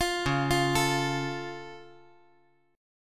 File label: C sharp Augmented down, chord; arpeggiated; JGuitar